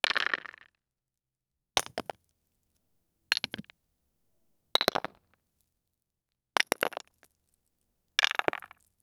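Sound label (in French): une pierre qui se sépare, rock fall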